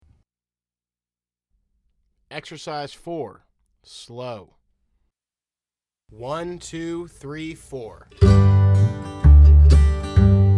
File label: Slow